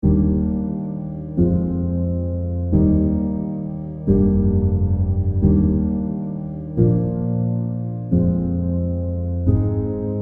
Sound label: default felt piano; scaler2